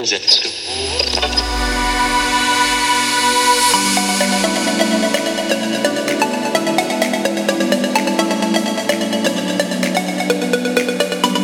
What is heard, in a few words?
Big Room House instrumental; 東方紅楼夢19